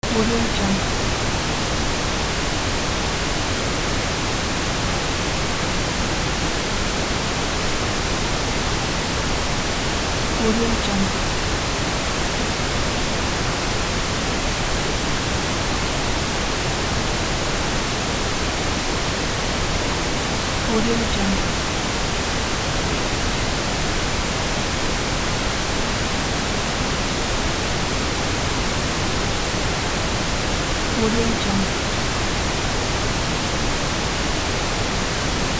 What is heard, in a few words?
pink noise